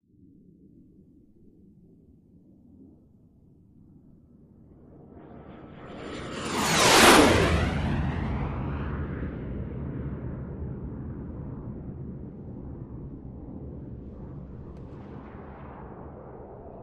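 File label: Gnat By Low Over Canyon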